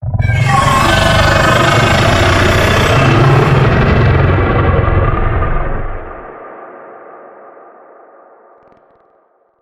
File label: Arachnoid Camper Vocal Stinger; Warframe; Corpus